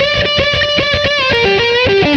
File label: guit lead; Sonic Foundry (Sony Creative Software); Ugly Remnants VOL-2